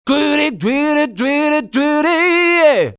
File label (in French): chant; Mobiclic51